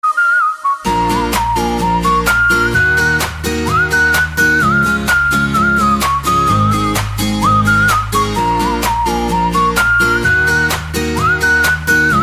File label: whistle